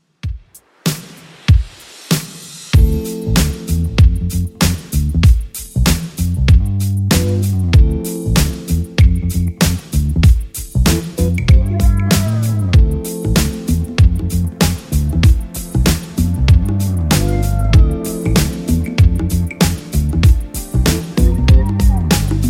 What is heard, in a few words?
90s Redrum 96bpm